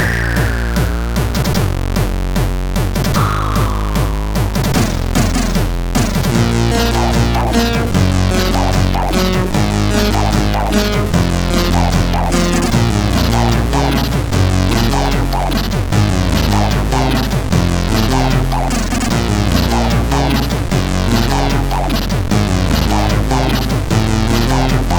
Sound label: intro, CSG8580R5 sid